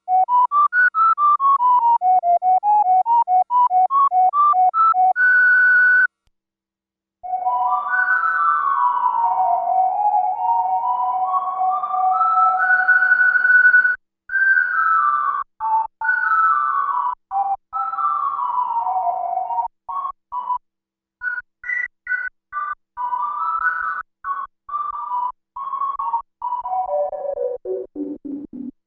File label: REVERB GATE